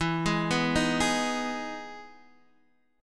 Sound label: E Major 7th E, Guitar, Drop D; chord shape